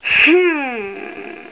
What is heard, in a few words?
Hmmm; Let's Discover Michigan!